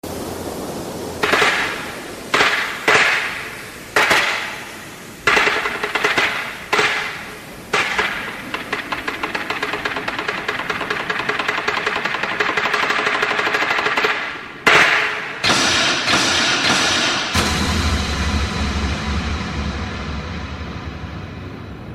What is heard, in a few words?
Drums; Naruto Vs Pain